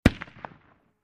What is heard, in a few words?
50 Cal Pistol Shot From Distant Point of View